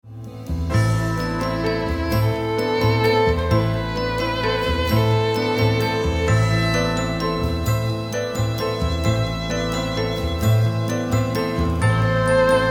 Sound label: carol bells